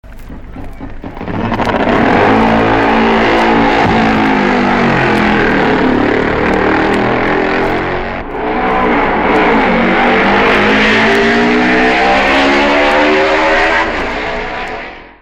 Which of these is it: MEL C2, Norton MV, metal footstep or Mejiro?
Norton MV